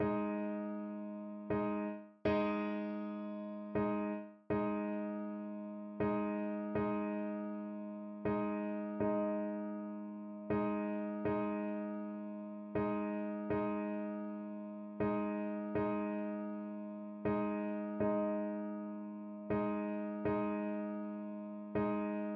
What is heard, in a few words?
horn, French Horn